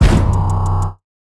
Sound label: 808's